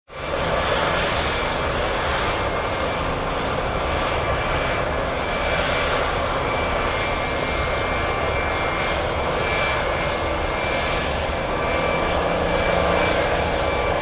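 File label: 747 Towed By Diesel Tractor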